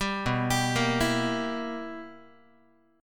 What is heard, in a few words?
B Major 7th Suspended 4th Sharp 5th Guitar, chord; BM7sus4#5; arpeggiated; 10,7,10,8,8,x